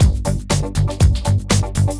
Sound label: FS intro splash loop; netent